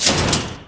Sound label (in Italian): porta up